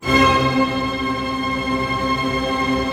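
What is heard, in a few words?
String Tremolo C, Right; Orchestra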